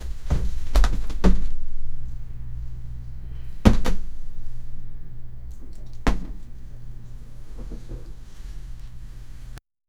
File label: falling forward onto the, flop